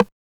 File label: RIM